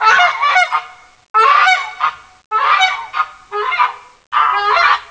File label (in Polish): ges